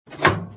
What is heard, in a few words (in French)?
Porte fermeture micro-onde; bruitage; Electro-menager